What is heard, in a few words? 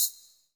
HH VERB